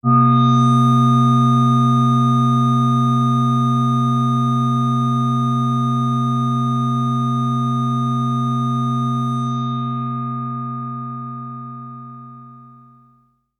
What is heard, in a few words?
SYNTH Vague Spanish Art Horror